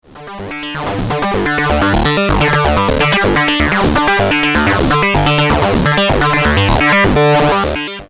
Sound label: Two Osc Pulse